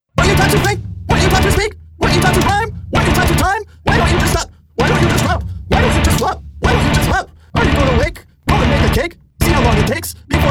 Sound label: Gated Metal